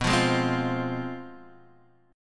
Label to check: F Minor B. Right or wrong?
Right